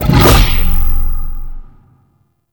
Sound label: shroud end burst; Warframe; Voruna